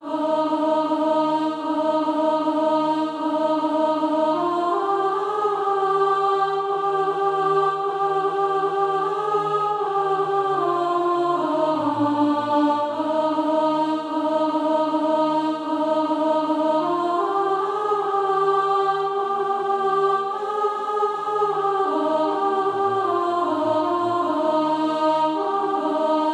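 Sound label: alto; A Sinner Forgiven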